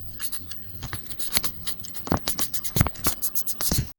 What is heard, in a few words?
rustling paper as noteboo, pencil scratch